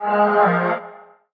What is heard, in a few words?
vocal shield Fm; SD AURORA Sample Pack; Slate